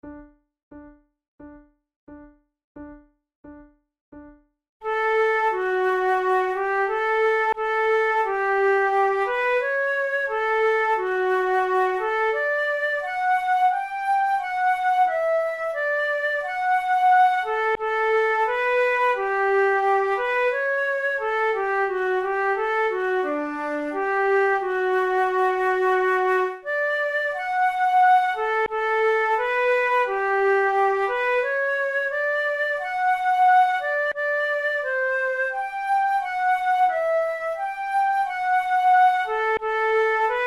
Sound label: duet, flute